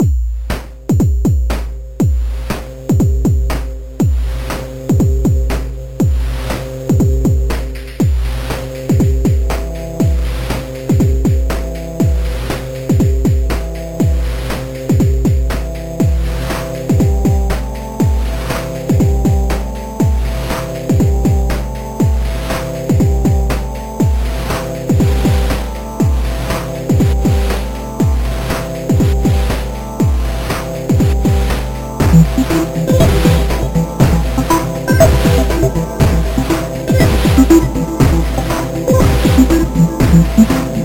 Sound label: drumthing just bitcrush